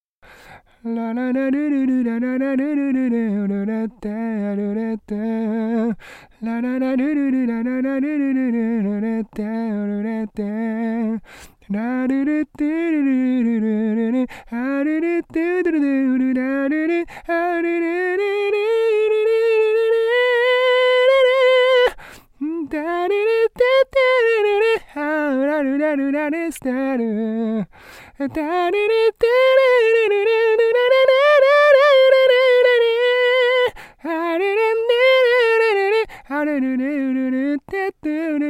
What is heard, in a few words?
vocal